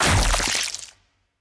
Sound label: Gore Explosion; Minigame 2